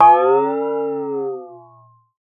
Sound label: water; Xsample VOL-9